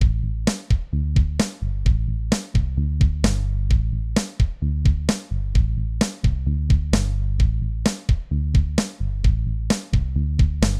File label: Rock Song